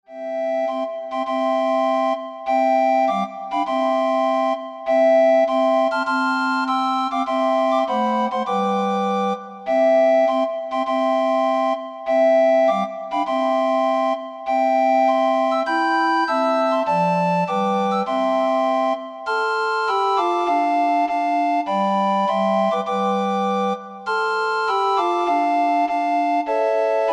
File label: Recorder Ensemble